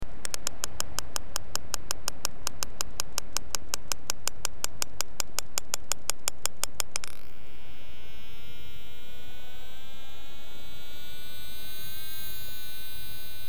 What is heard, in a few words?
clicks foraging buzz 500m, los calderones; gritan en un intento de hacerse oir entre el ruido de embarcaciones